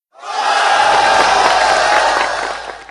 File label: cheer